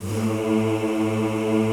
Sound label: A1 L, Classical Choir; Best Service; Extended Classical Choir